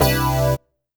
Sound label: CHORDS; EastWest; Dance Mega Hip Hop Electro